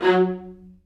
Vla F#2; Digital Sound Factory Virtuoso

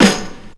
Snare, Drum&Bass

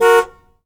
HORN A, CARS

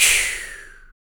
SHAKER, MALE PERC; Spectrasonics Vocal Planet; Jazz FX

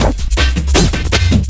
Drum & Bass, 160 BPM

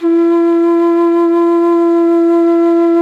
QUE E 4, quena; SoundBytes - Early Patches

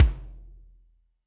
Kik Garage, Drum Machines Alesis D4

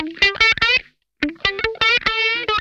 RASP LICK, Funk Guitar; 90 s Sample CDs; Zero G

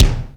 ROOM KICK 1; Greytsounds; Scott Peers Sound Engineering

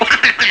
hahaha; ST-XX Amiga Soundtracker Sample Packs

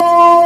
B3 Lo Dist Slow D#3

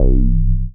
BSE C, BASSES, House

